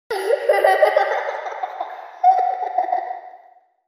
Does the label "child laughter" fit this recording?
Yes